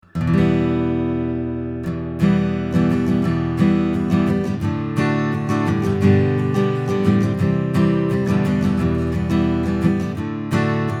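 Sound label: Coles 4038 Acoustic Guitar Strumming WA12 Preamp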